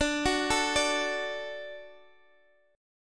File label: D-Major, D, Guitar, Open D